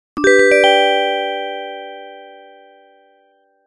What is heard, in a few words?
light hearted, message tone